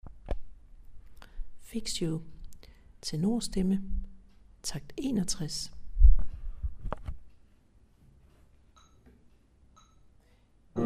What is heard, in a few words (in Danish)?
Tenor